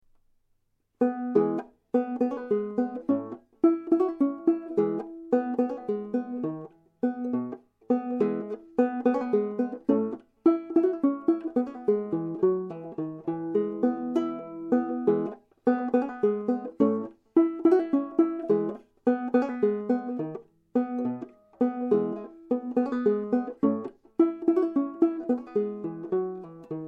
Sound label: irish jig, banjo